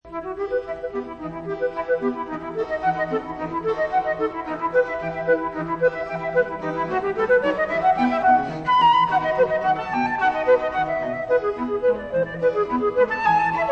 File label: sonata; j s bach sonata5